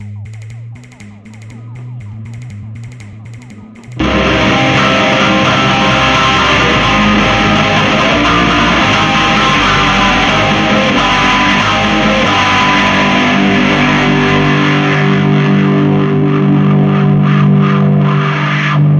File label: Flamenco